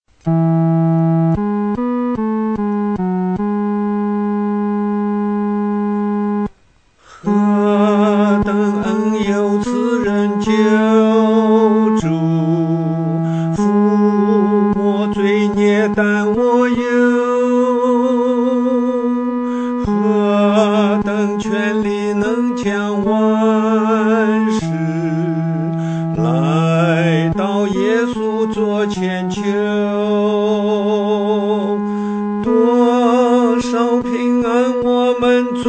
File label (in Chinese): 独唱第三声部; 何等恩友